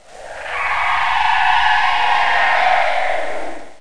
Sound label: wraith; Total Chaos Nukem 3d Pack; duke